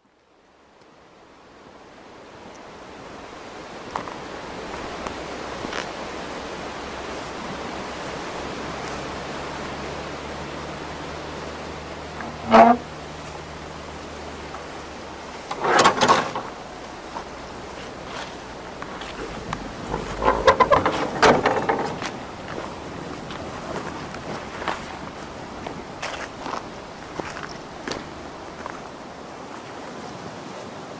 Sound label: Dorset Windy Soundscape